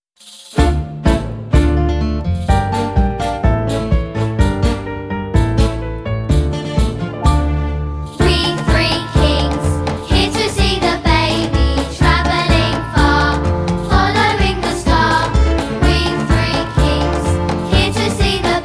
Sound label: we three kings vocal